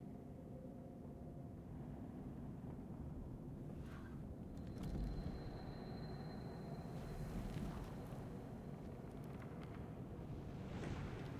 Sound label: ambience loop; tft set16